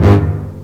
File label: HIT, Hits & Brass